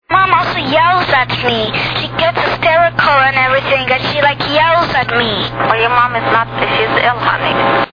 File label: yells